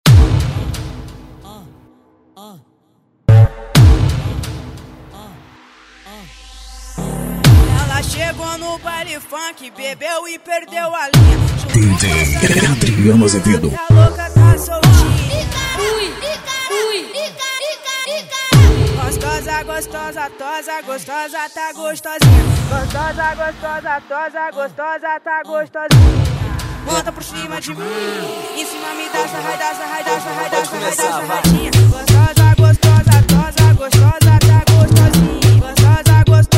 funk bass o poder do grave

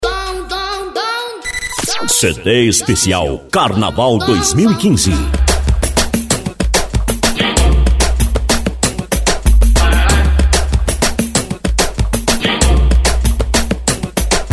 pagodao funk; total som; carnaval 2015

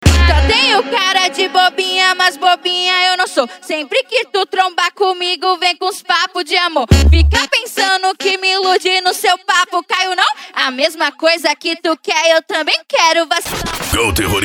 dance e funk mega bass

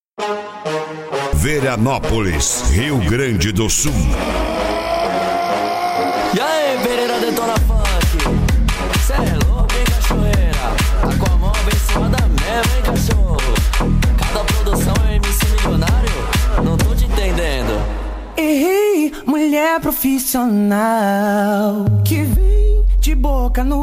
mega funk

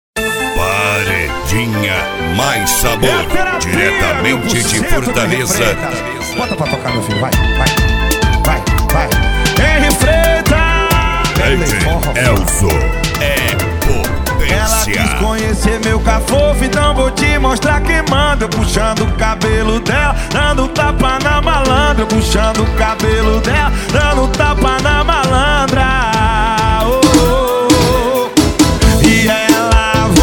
medley forro funk

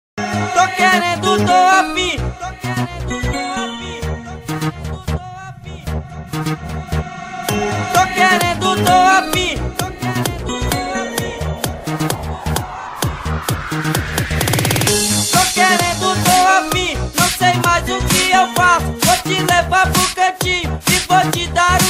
eletrofunk

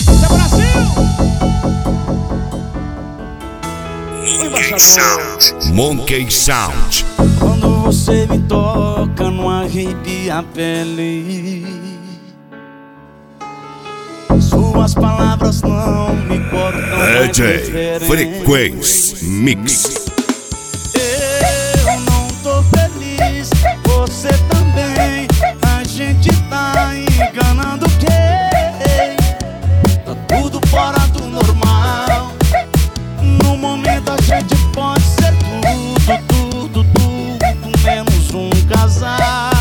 esp balada

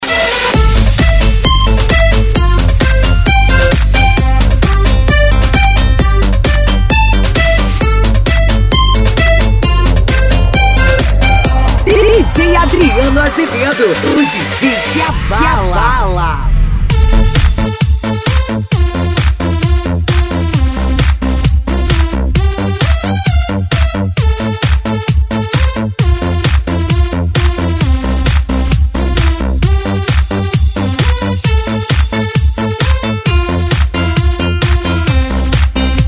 eletro house e eletro funk

